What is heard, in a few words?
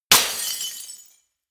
glass smash